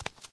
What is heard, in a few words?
hard barefoot, Footsteps